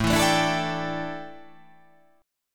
A 7th Suspended 4th, A, down

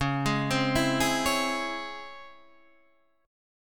C sharp Minor Major 7th C sharp, chord; C#mM7 arpeggiated; JGuitar

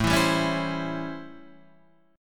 A 7th Sharp 9th A, Guitar Half Step Down

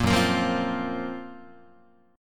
F sharp Major 7th Flat 5th, down, Guitar; 1,2,1,3,4,4; 4A,4E,5A,5D,5Gsharp,6Csharp; strummed

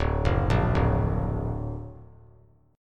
D Augmented D down; D+ arpeggiated; JGuitar

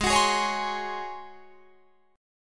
Ab 11th Ab Guitar Drop D; Ab11 strummed

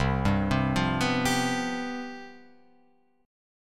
C Major 7th Suspended 4th C down Guitar Open F; arpeggiated; CM7sus4

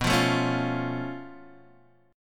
C sharp Augmented 7th B

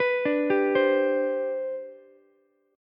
C Major 7th Suspended 2nd C down Ukulele, chord; CM7sus2 arpeggiated; JGuitar

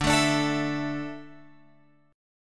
D Suspended 2nd D down Guitar Open F, chord; strummed

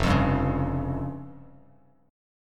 F Suspended 2nd Sharp 5th, Bass Standard; Fsus2#5 strummed; JGuitar

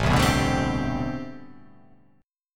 A Minor 11th A, Chord

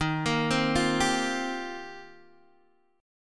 Eb 6th Add 9th, Guitar, DADGAD

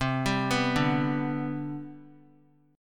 C Major 7th Suspended 2nd C down, chord; CM7sus2 arpeggiated; JGuitar

